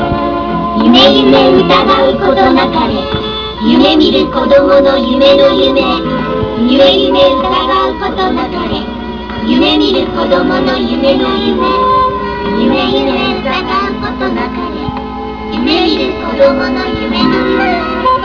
dream chant

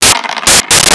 Dice

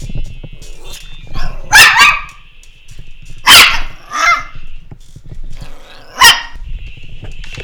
bark

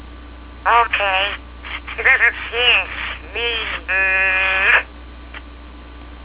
kiss; furby giving a kiss

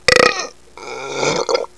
burp

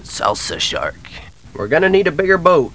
salsa; SALSA SHARK!

